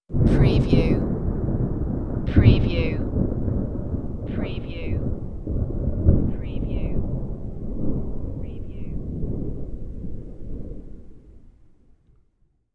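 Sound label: Thunder Clap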